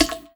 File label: vent step; headcrab classic, scripted; creatures